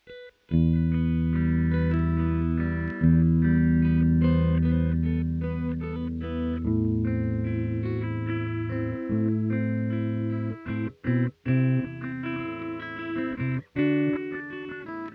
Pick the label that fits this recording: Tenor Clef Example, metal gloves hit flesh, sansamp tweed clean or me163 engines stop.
sansamp tweed clean